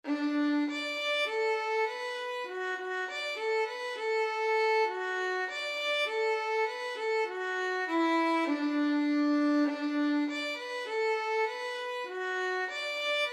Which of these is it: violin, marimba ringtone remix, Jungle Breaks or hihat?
violin